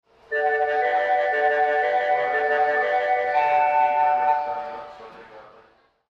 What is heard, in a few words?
yotsubashi melody; osaka